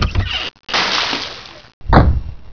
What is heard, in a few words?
ice chest